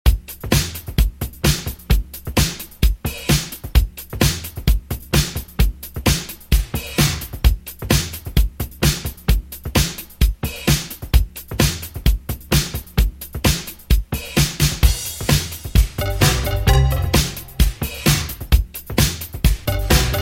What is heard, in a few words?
Funk 80s Redrum 130Bpm